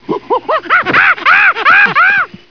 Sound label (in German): affenhorde; geraeusche tiere